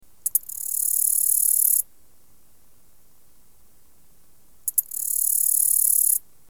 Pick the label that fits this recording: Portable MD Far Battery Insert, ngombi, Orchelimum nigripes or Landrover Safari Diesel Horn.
Orchelimum nigripes